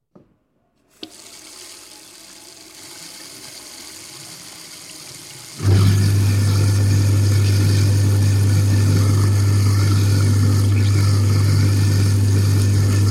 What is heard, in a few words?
Kitchen Sink